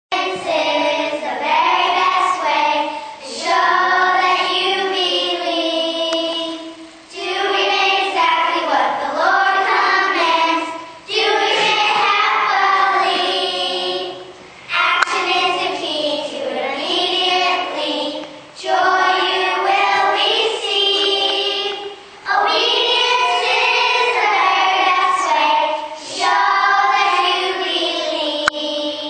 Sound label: Choir singing